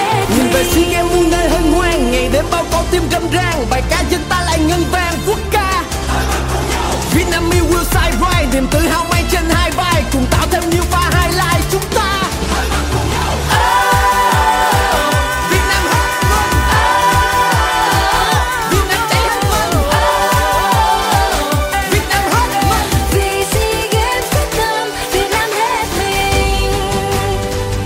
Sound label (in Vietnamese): Nhac Chuong Rap